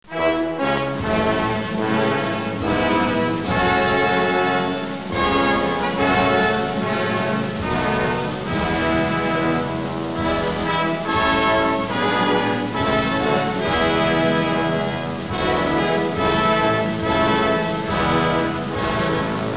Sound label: anthem